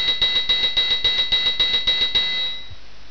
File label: DINGING